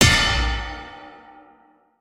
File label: Anvil Item